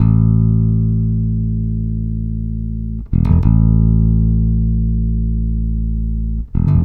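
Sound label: Country Bass Breakdown; Digidesign; Pro Tools Essential Loops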